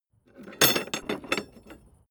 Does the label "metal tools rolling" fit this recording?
Yes